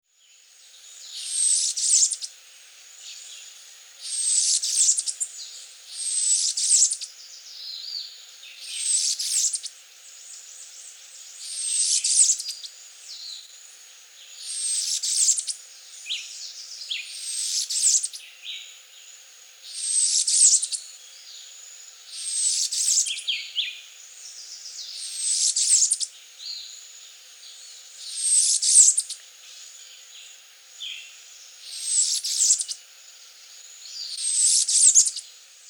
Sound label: Sucrier Bananaquit Honeycreeper, chants des oiseaux; Guadeloupe